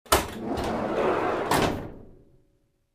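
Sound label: truck side door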